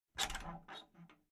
Household Old Farm House Door Loose Metal Latch Rattle Wobble Eerie; Creaky Door